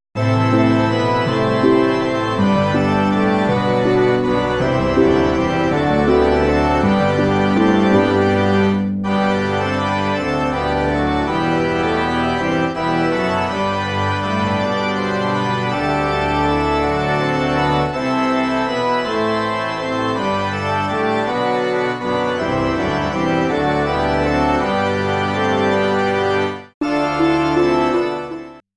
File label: backing; backing tracks